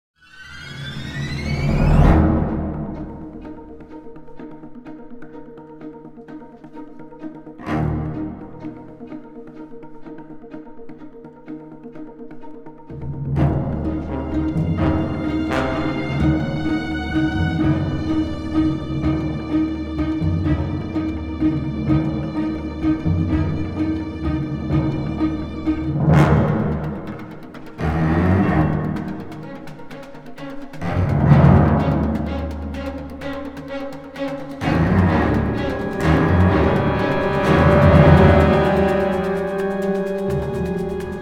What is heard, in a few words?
Action Airport Chase